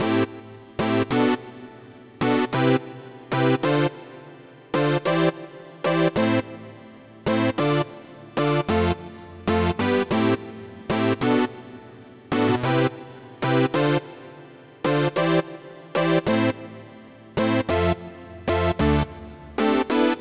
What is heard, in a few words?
Poly Stacks